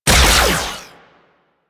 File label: Shot Fire; Disc Moa